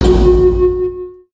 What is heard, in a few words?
Yar Har